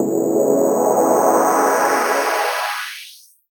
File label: Sweeps Medium